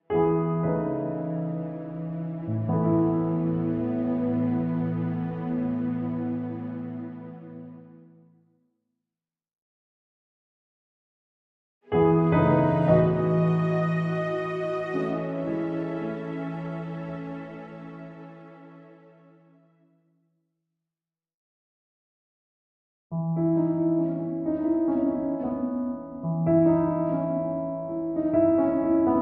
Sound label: Underscore With Strings